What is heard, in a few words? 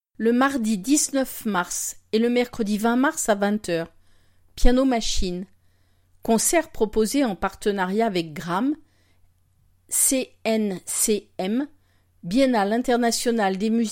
piano machine